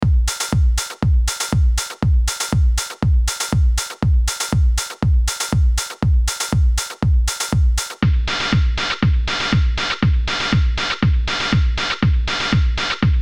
Dist Opamp 21 Drumloop; ARTURIA

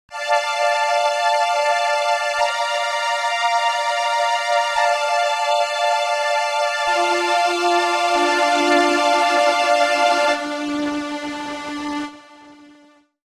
Vocoder Plus strings; XILS

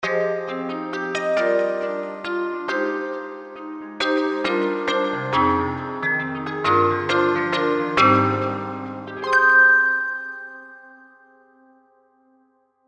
Alchemy Random

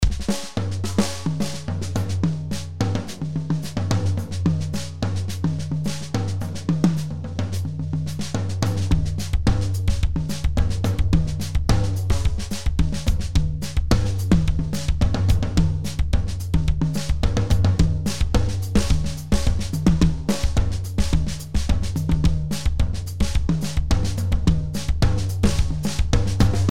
Drums Studio Pop; Addictive

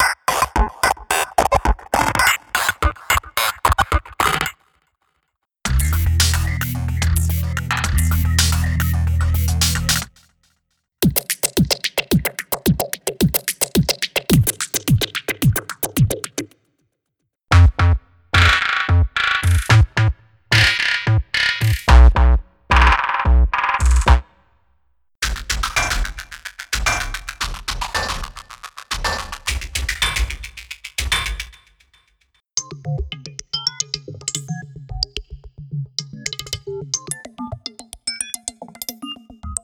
Loops Electronic; CinemorphX Presets SC

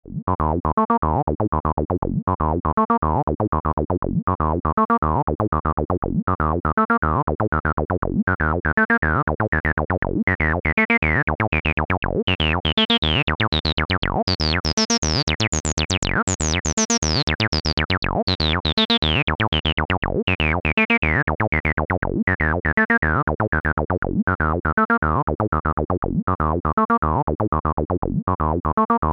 Dist Tube Culture Acid Bassline; ARTURIA

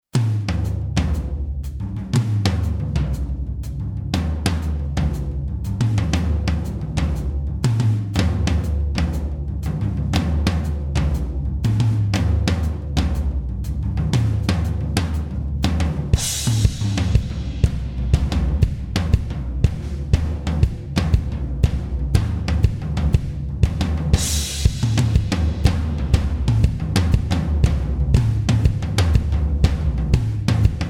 Drums WET

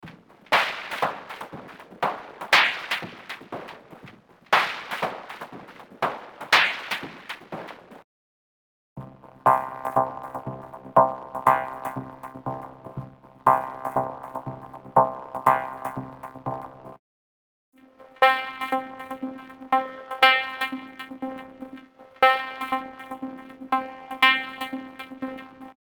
Vocoder Drumloop; Acoustica

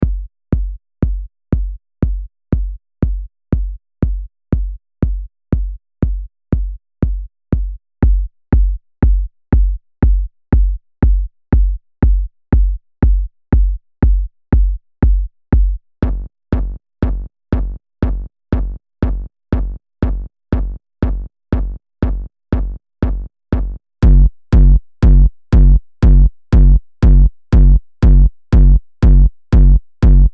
Dist Opamp 21 Kick; ARTURIA

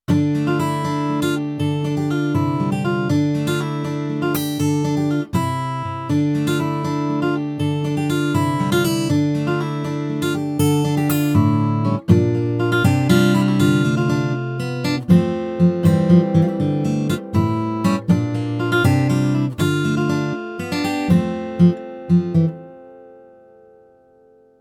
Guitar; AmpleSoung; M 2 Lite

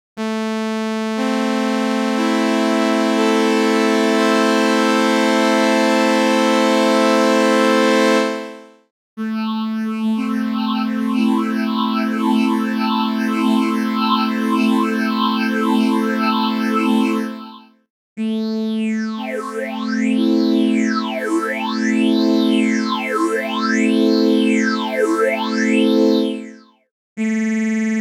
PHASER BI-TRON; ARTURIA

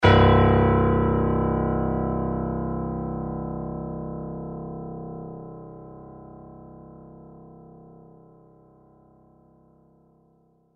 C1 played long Grand Piano; EZKeys

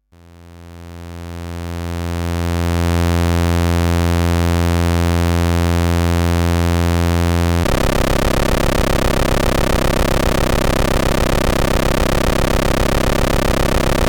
VCO SAW FM

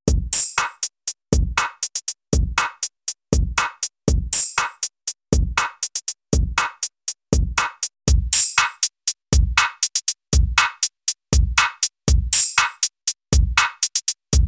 TEN BAND GRAPHIC EQ Drumloop; CHERRY AUDIO RACKMODE

